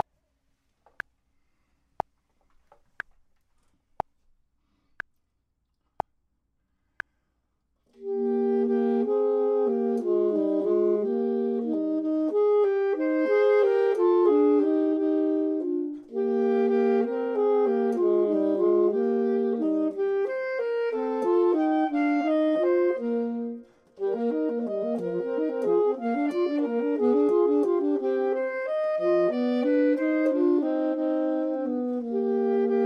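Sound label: both, Saxophone and Clarinet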